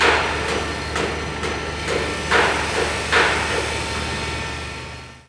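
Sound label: hammer ram, construction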